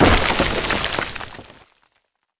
Breakable Wall

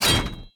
sword jump land attack; unsighted

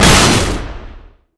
Ice Arrow Target

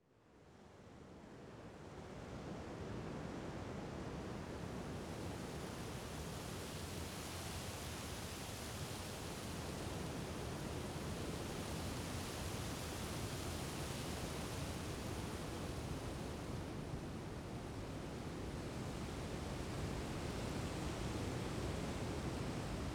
Ambience; Kuon; PS2

